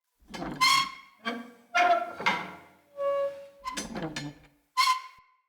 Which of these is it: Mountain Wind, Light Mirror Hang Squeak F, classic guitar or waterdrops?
Light Mirror Hang Squeak F